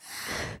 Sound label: woman gasp